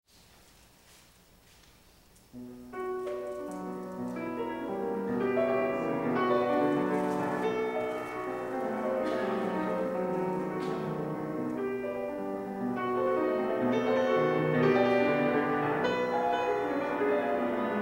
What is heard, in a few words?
Prelude Chorale and Fugue LIVE, Piano; Franck